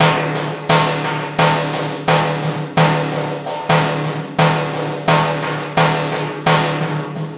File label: Synth Loop 130 D, Techno; Loops; Synths; GhostHack; Ghosthack - Free Techno Sounds 2024